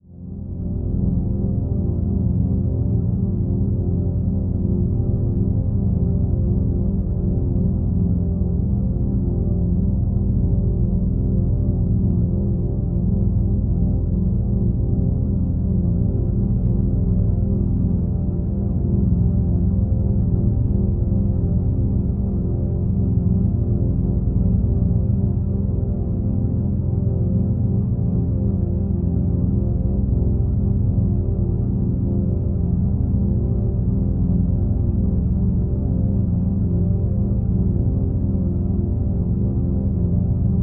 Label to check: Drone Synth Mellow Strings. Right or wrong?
Right